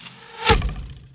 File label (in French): fleche; Une flèche